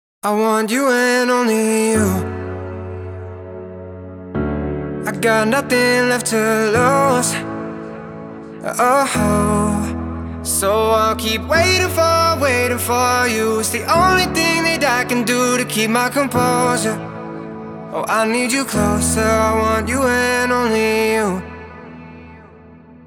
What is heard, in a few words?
main proc piano